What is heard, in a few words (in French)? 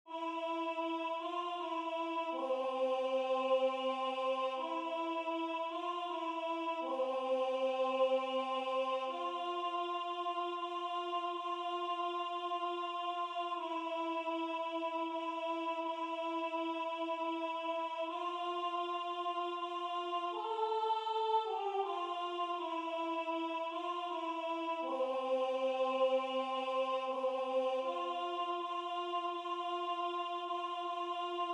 Douce Nuit Alto